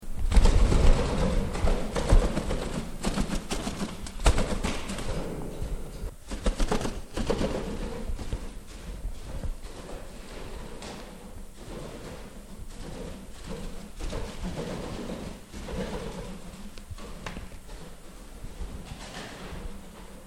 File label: Wing flaps budgerygar small bird, Bird fast take off - flies away; Animals